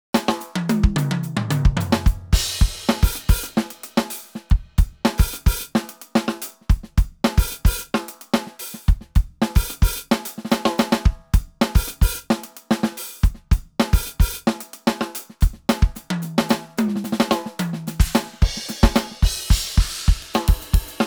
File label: efnote pro improvisation